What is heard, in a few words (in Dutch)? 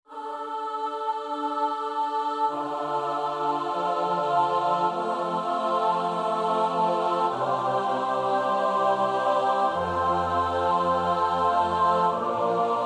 S-A-T-B, koorpartij oefening; Tomas Luis de Victoria; Officium defunctorum a 6